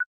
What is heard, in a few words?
camera timer countdown; iOS Sounds